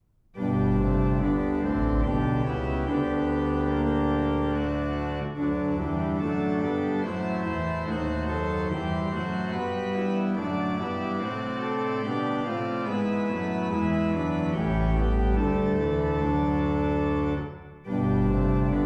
orgelkoral; hyggelig rolig

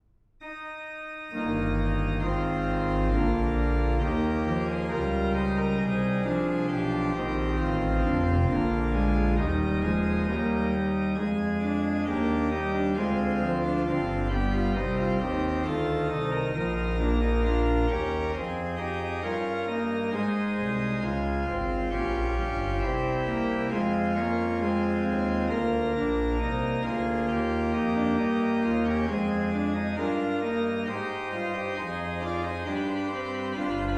orgelkoral

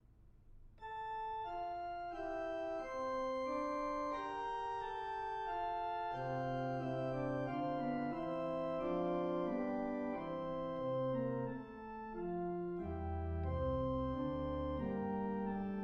forspil; Herre Jesus vi er her

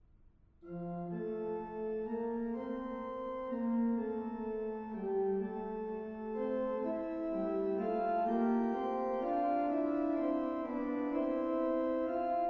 forspil; Aleneste Gud i Himmerig

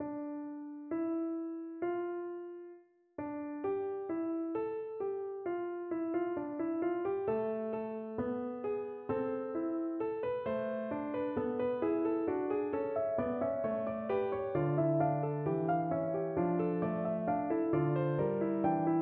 fuga i d-moll

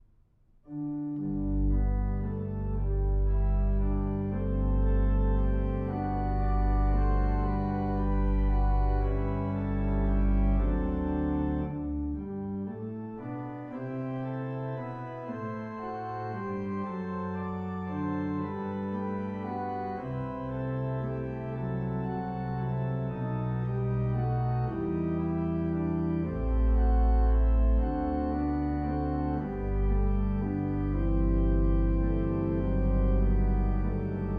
forspil G-dur; Nu falmer skoven

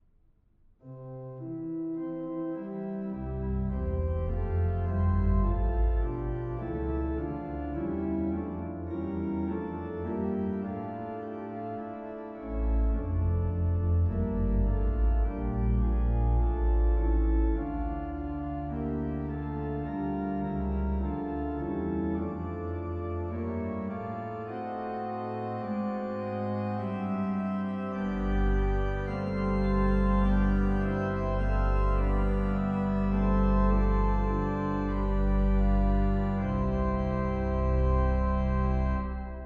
forspil C-dur; Den signede dag med fryd vi ser

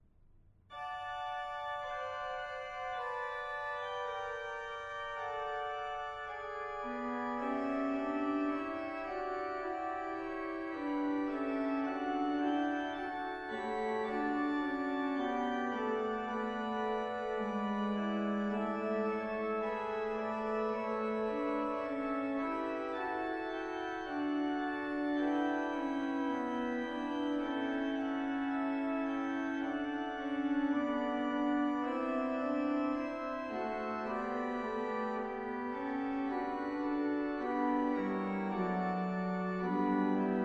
forspil; Julen har englelyd

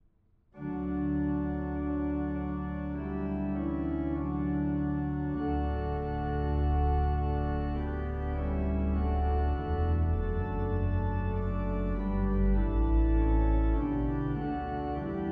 Dejlig er jorden sats D dur

